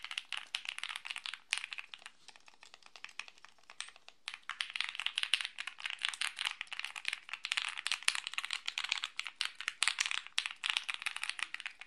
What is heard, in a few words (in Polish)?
Dzwiek Tide Alice; Epomaker